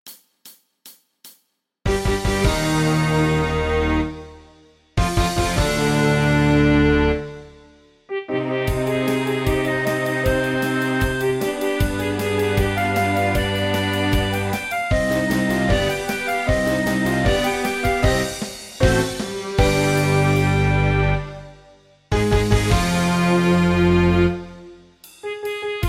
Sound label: Symphony No5 modern remix; Ludwig van Beethoven